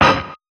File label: Hats, Alesis Performance Pad